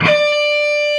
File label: long solo D#, heavy metal; Greytsounds